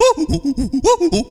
VOC DRUM, MOUTH PERC; Best Service Hyper Dance

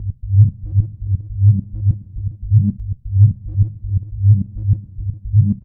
Heartbeats Bass; Sonic Foundry (Sony Creative Software); Knutrix Drum & Bass

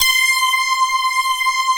C6, SYNTH; SYNCMAT; Q Up Arts; Dream Experience

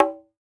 CONGA BATTER

